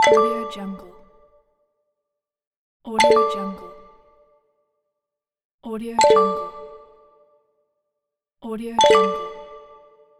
game crystal; absinthemedia

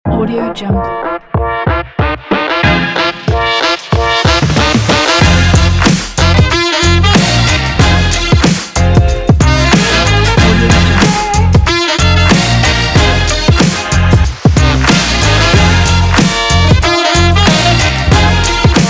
hip hop

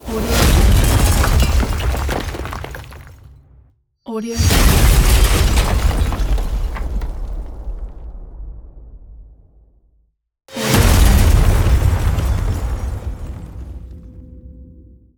explosion

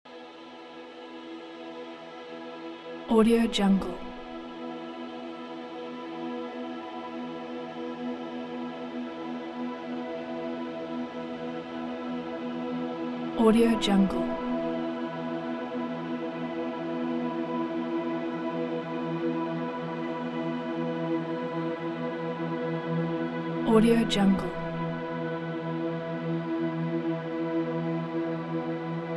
cinematic memories soundscape

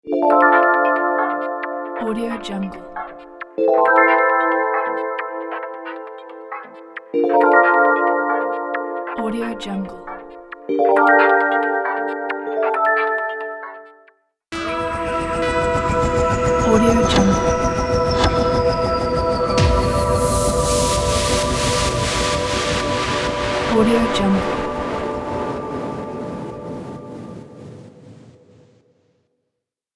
background logo pack

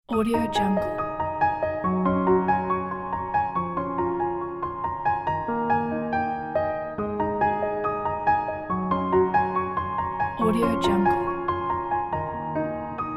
uplifting ambient summer falls solo

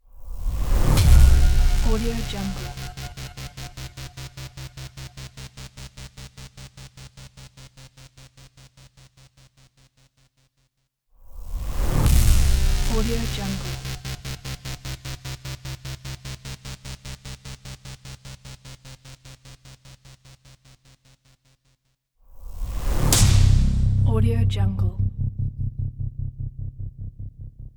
cinematic prophet fx

